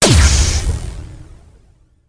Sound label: plasma; StarSiege